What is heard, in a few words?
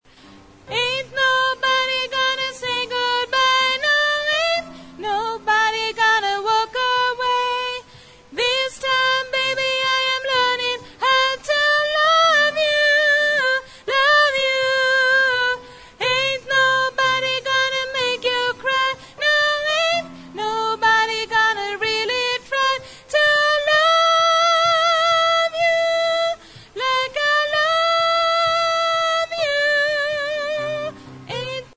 tenor